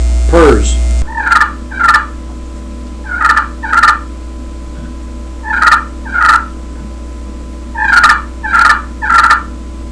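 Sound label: triple throat slate purrs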